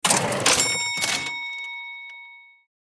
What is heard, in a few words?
Cash register open ding; shopping